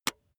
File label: razor attachment retract